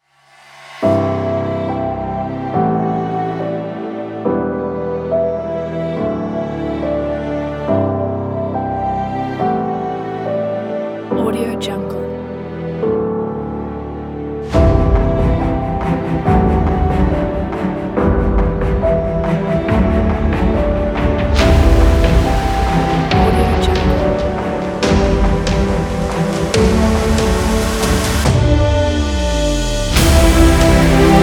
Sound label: emotional epic